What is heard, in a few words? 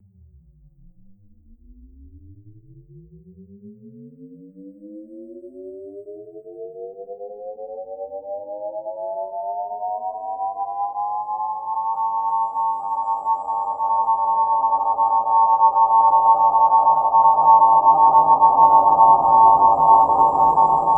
Alien Space Sound